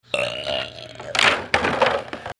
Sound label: burp explosion